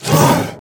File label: Spider Grunt General Scream Start Combined; Deep Rock Galactic Boardgame